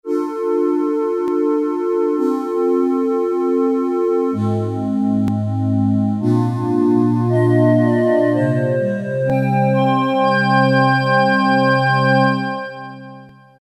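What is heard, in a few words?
Breath Organ, Yamaha TX81Z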